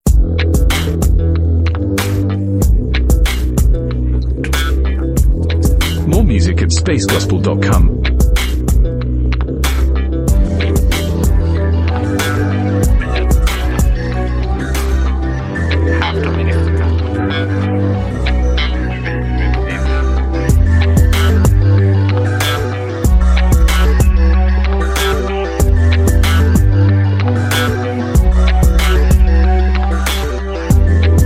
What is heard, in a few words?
INSTRUMENTAL Version